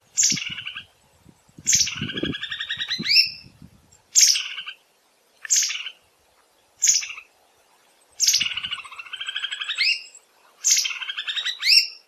White-whiskered Laughingthrush calls; Dasyueshan National Forest Recreation Area Heping District

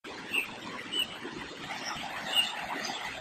Scaly Breasted Bulbul calls; Amar

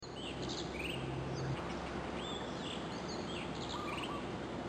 Blue Rock Thrush Monticola solitarius madoci call; Amar

